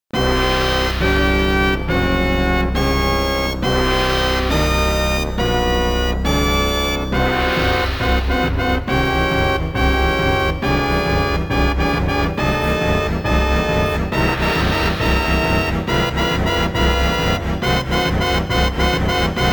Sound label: Game Over; Donkey Kong 64